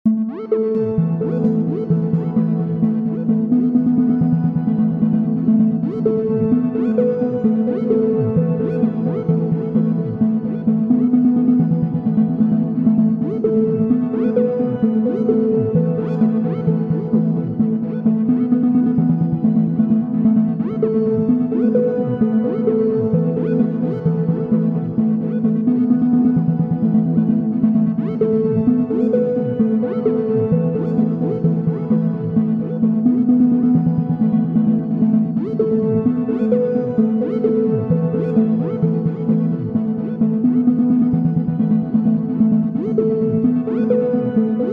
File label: Emotional Ambient City; After the End The Harvest